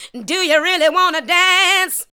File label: ADLIBS 90; Ueberschall; Jam Box